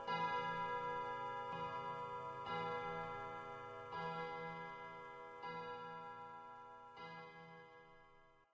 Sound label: Clock